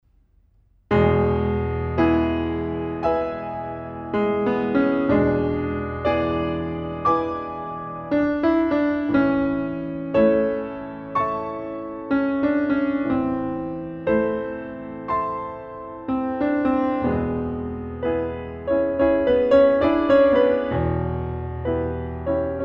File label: Jazz Club, piano; Manhattan